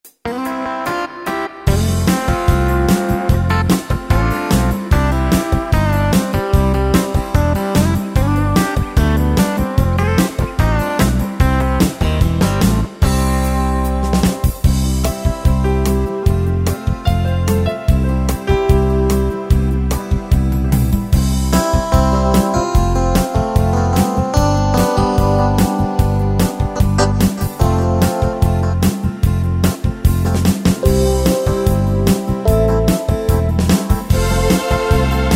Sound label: Heart Beat, Pop & Rock